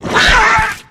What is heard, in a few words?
Dmg; Trillipy; Fly For Insanity